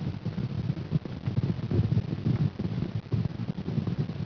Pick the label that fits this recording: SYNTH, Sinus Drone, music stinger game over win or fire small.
fire small